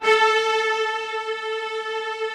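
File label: string, orchestral strings; Greytsounds VOL-1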